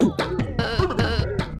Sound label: Clockwork Machines E - Human